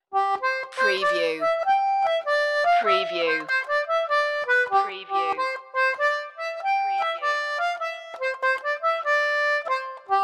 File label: solo concertina; i saw three ships